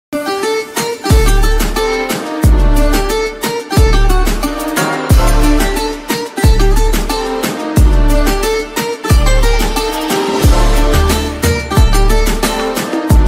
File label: best arabic instrumental ringtone